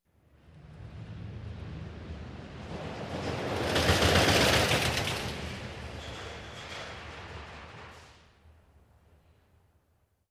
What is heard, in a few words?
Roller Coaster Bys